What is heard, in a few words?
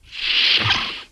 Star Trek door swish; SCIFI